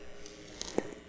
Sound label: chirp; VLF whistle